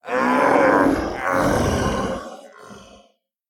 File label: Stagger; The Town